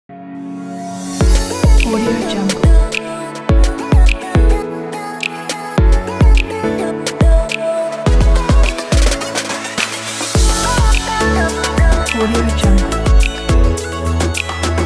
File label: commercial modern pop logo